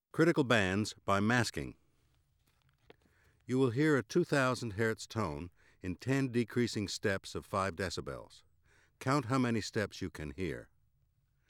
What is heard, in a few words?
Critical bands by masking